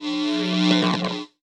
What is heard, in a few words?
Electric Buzz Swell Crackle